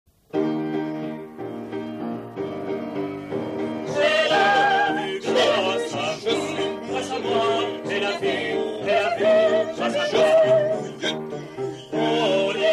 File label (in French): avec piano; Trio de la baudruche (extrait, avec piano)